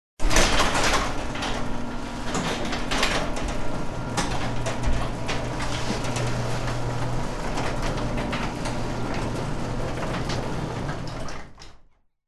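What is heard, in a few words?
door sounds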